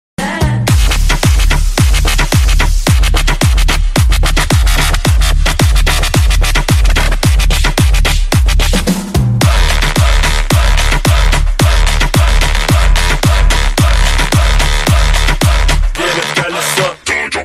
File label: partymix popuri club dance remix